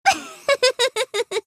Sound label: laugh